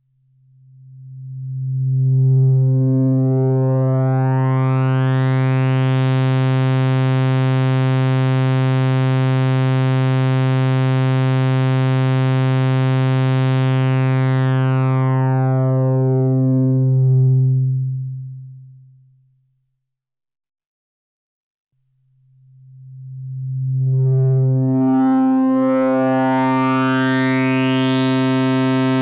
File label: Filter Sweep Resonance 50